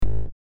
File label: bug; numerique